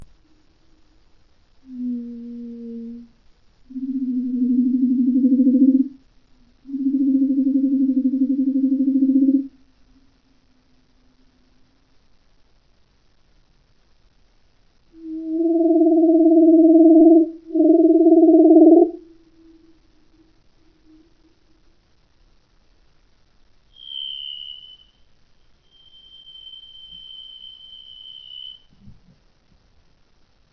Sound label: leopard seal trills and whistles; brian miller; australian antarctic division; casey 2014